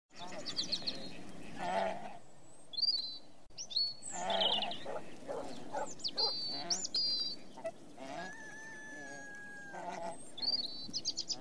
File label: Ambient Sound; Infectonator 2